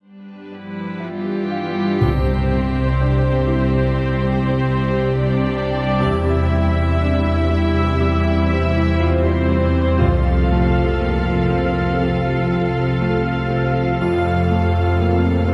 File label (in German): Hoerprobe Klangwelten